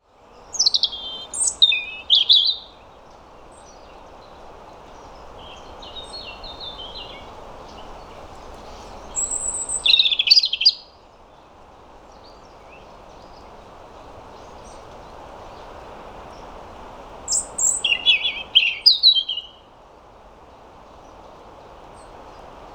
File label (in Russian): zaryanka erithacus rubecula, zvuki peniya ptits